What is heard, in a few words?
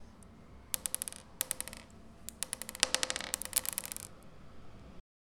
Dropping Of Marbles